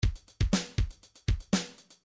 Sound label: midi drums drums 8 beat